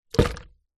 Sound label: household small plastic container with water in down of surface